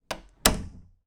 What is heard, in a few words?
Door